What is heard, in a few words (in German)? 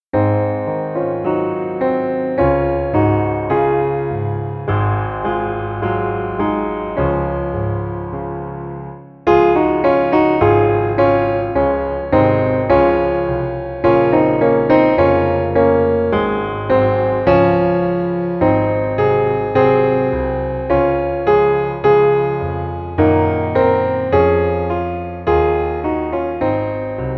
froehliche weihnacht ueberall inst; Weihnachtslieder